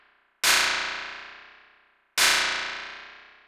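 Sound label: FEEDBACK snare drum, Techno 138 BPM; Best Service ProSamples vol.54; AKAI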